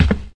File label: Footsteps